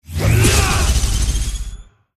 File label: attack sensei snow